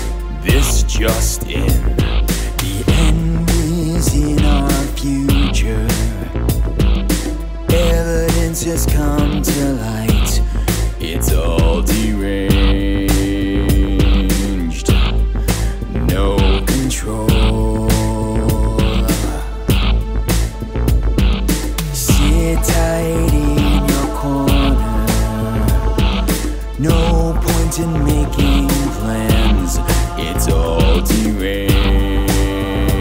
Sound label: with Vocals